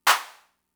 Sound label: Clap; Fricke MFB-522